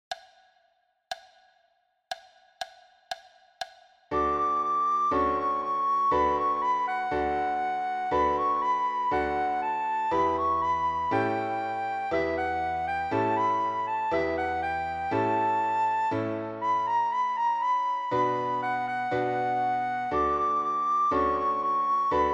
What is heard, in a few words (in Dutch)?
sopran rustig; Flierefluiter 7